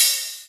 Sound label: Hats, Elektron MachineDrum SPS1 MKII